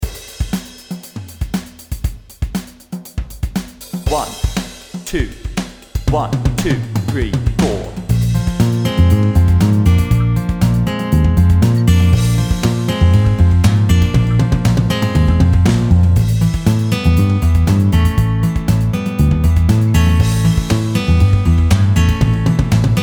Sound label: speed slurs Bb @120